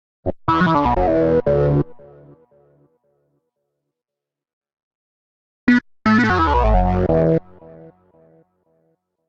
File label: Lead B Minor 86 bpm; MIDI Clips; Tonal; Melodic; Buck Jones; Ableton; Factory Packs; Chop and Swing